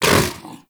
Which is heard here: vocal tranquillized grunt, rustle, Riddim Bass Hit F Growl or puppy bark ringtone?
vocal tranquillized grunt